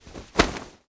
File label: Nylon Swishes Quick, whooshes & swishes